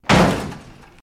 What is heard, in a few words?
Jeep Crash Shrt On Me, car crashes